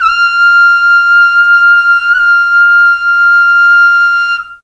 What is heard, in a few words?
F4 TRUMPET, mellotron; CHMB TRUMPET